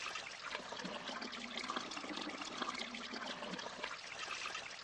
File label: water